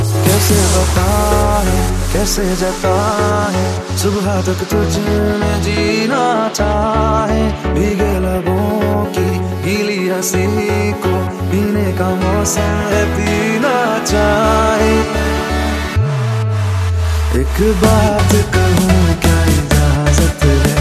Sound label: Remix Hindi Song Ringtone